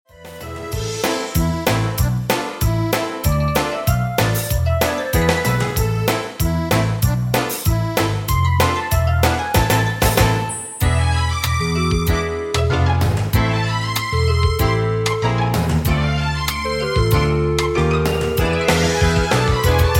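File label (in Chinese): Karaoke, 兒童詩歌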